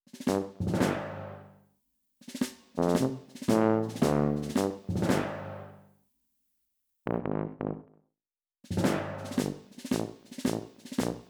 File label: BGM rhythm